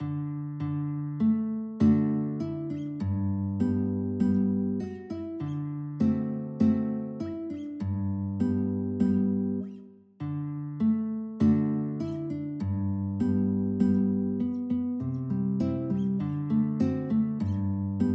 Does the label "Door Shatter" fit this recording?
No